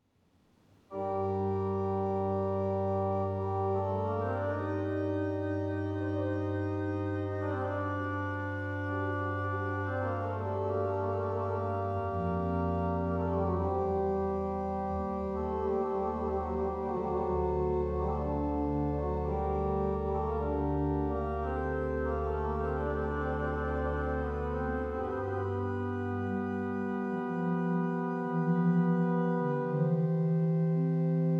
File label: tierce-en-taille, Organs; Tierce en taille Accompaniment as the one above, LH on Choir Claribel, Bourdon, Praestant; phoenix